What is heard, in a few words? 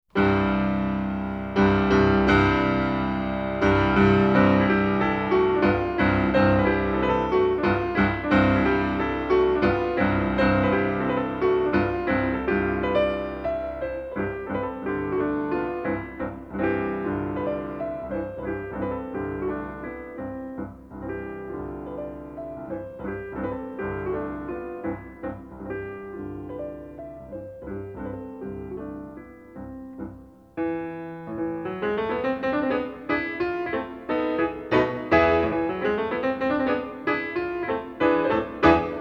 chopin mazurka op 56 no 2